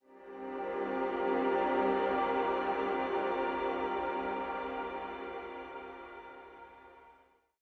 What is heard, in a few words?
Health Flourish; Insanely Twisted Shadow Planet